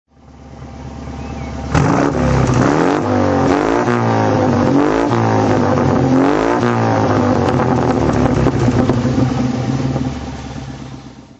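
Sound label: healey 100 le mans throttle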